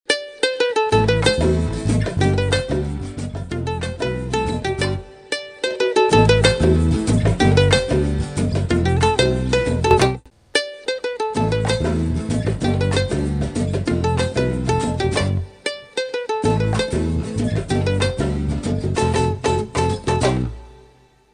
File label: Bgm Classic instrumental Ringtone